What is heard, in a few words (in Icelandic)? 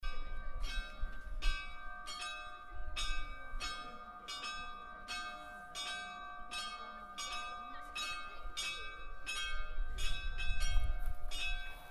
reykholtskirkja, kirkjuklukkur